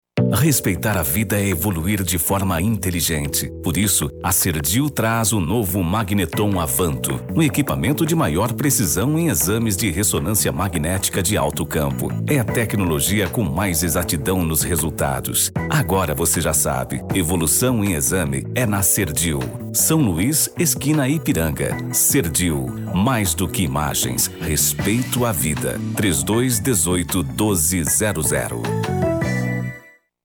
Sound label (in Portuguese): Trilhas